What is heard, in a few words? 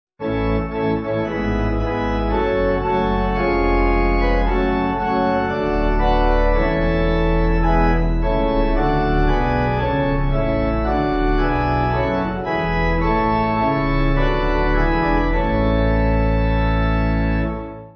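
Pipe, Pipe Organ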